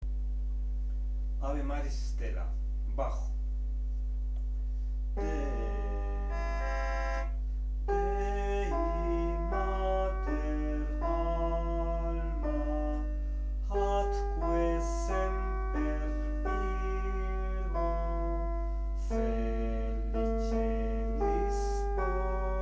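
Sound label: bass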